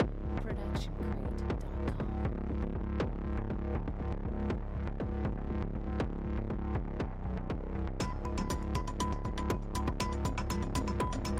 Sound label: moog bass groove; soundscrate